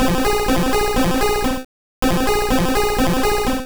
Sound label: Hydro Pump; Pokemon; Gen 1; attack moves; RBY